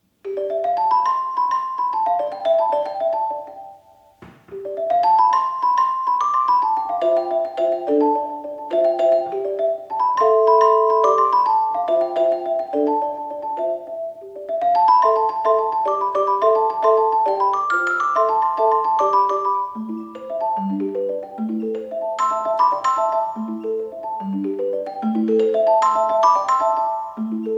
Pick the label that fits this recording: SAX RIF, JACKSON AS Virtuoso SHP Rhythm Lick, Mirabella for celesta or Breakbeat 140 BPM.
Mirabella for celesta